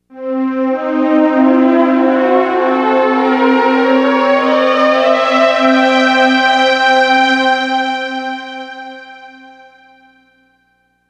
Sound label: Dur II, modes